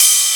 twalk, Hats Electro Open

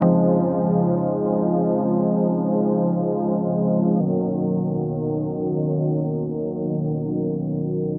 keys

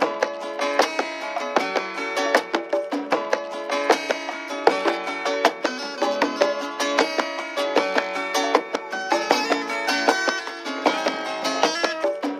guitar and congas, Phonky Loops

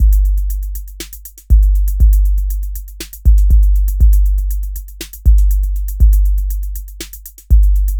Drum loops, Electro

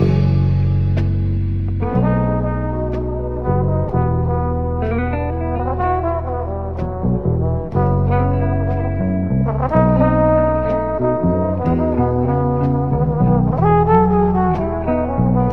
horns, jazz loops

